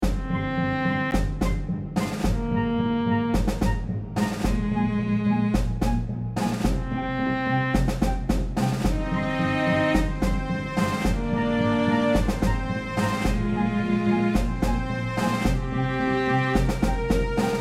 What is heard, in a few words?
Orchestra